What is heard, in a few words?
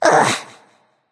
ugh; smite; voicepack; scylla